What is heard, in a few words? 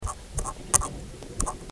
Hypoptopoma gulare, Amazon Fishes